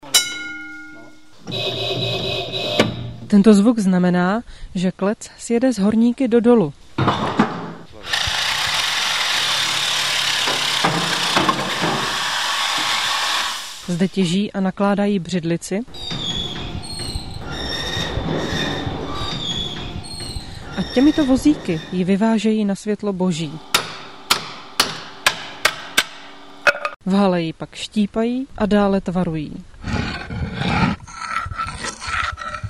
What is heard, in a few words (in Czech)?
Zvuky břidlice